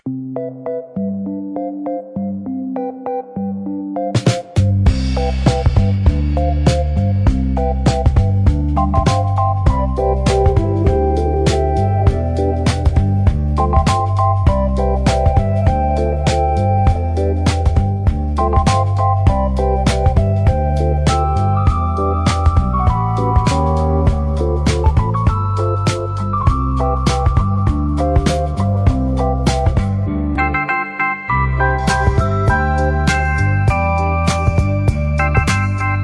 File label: BGM Mukbang; Gan Le Ge Fan; WeChat MiniGame